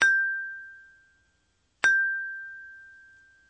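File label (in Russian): вибрафон одиночный высокий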